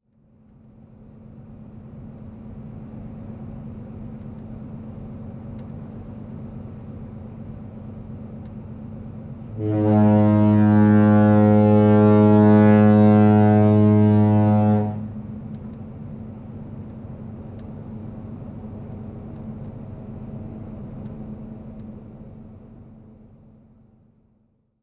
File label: Oslofjord Foghorn Stern; Colorfantasy